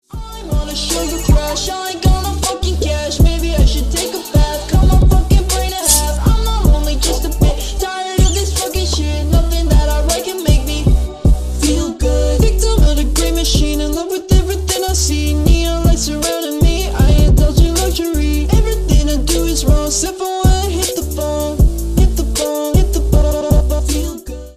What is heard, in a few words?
slowed remix